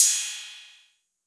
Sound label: Roland 808 CY